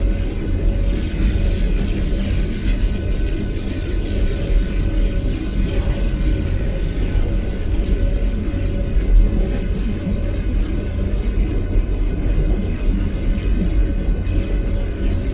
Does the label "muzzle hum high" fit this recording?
Yes